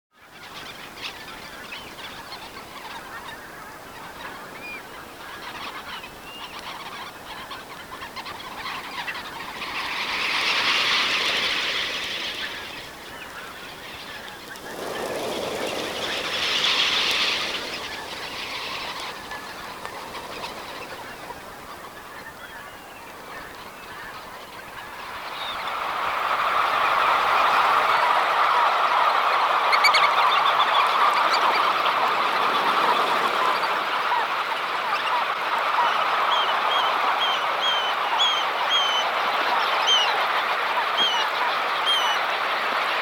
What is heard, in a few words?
Knot